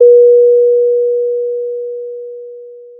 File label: Sound of a doorbell